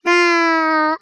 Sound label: Sax Baby